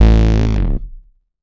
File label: 106 Swoop, One Shot Bass